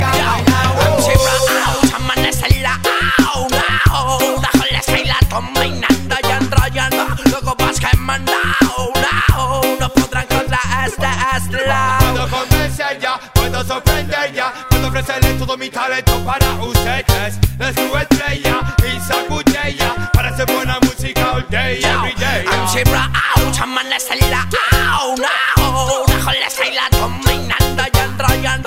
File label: dancehall; reggae